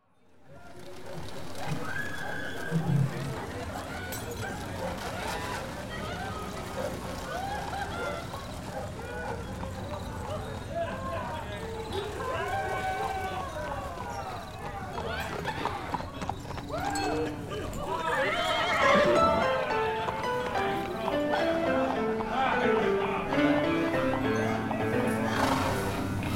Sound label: Wild West Saloon